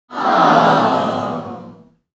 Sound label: Crowd aww sitcom reaction